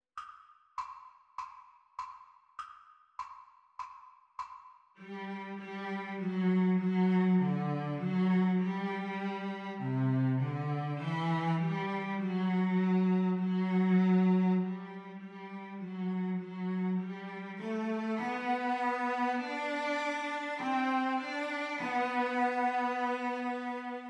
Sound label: Cello Duet Playalong; Exam Speed; The Teacher part